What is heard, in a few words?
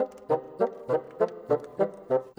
Bassoon, Orchestral; Sonic Foundry (Sony Creative Software); Orchestral VOL-2; Modern 18